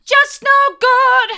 just no good hard, vocal

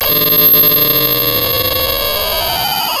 Sound of a cat meowing

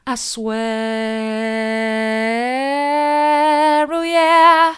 i swear soft, vocal

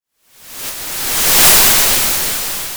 Sound of waves crashing on a shore

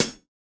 ClHat, drum

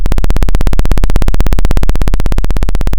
Sound of a plane taking off

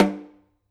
Snr Off, drum

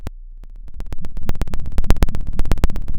pop, Sound of a fire crackling

